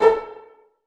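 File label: pizzicato A2 MF, STRING PIZZ; Sound On Sound - Peter Siedleczek's Orchestra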